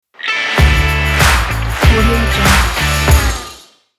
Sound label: rock commercial logo